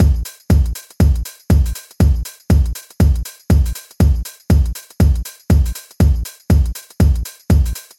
Hard Chicago House

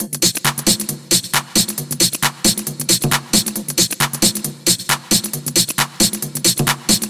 Latin House Loop

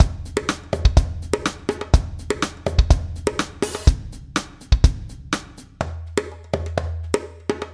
Latin Funk Drums

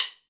Drum Stick Hit

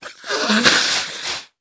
Blow Nose Sound

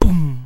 Beat Box Kick

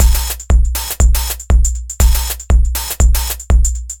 House Music Loop

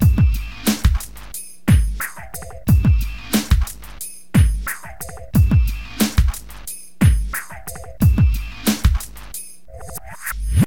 Hip Pop Beat

